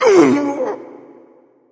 Hood Wolf Wound Crit

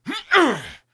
Attack; Keleseth

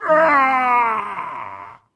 Wound Crit; Captain Rupert

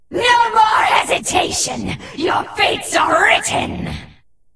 FELBLAZE BERSERK

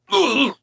Wound Crit; Valanar

